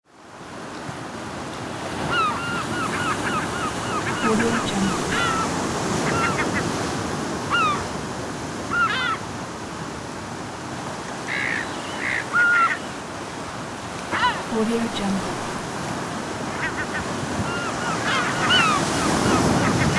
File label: sea ambience with seagulls